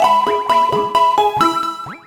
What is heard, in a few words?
use heal